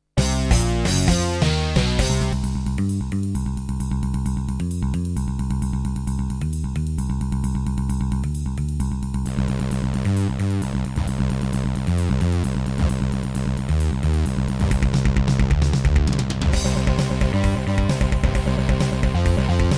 Yamaha DB51XG GS MODE; sigil doom episode 5

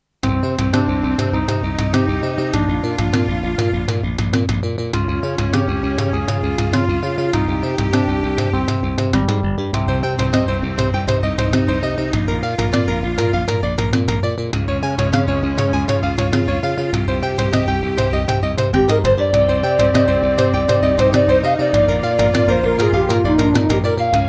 Gallant GMW-1000; Hocus Pocus

